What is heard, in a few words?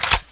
clip out; tfa cso; magnum lancer